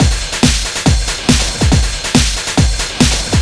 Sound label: Noisetech, Drums Beats